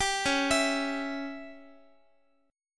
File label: C sharp Diminished C sharp down Guitar 4A 4E 5A 5D 5G sharp 6C sharp; C#dim arpeggiated; JGuitar